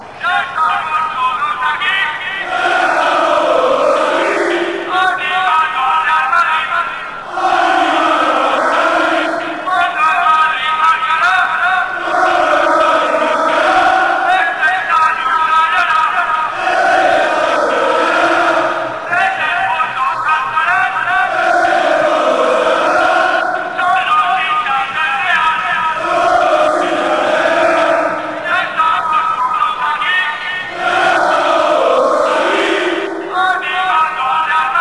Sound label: madrid chant